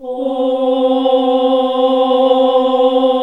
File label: OHH C2, classic vox